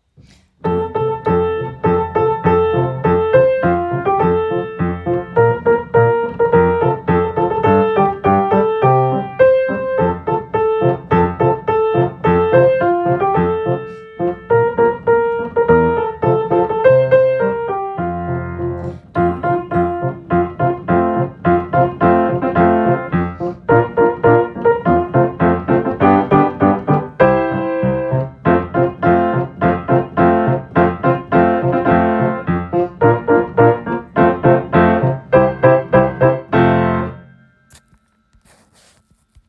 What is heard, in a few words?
Jingle bells with chord roots